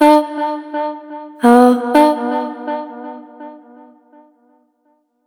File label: female vocal chopped